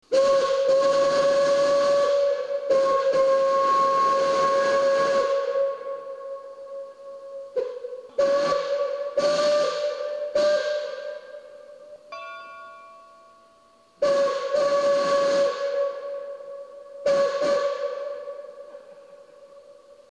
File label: horn